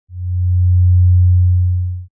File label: single burst 90Hz